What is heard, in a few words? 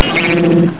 BLINK